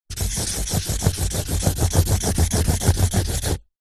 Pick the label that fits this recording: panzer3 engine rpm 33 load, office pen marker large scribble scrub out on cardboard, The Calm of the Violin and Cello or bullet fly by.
office pen marker large scribble scrub out on cardboard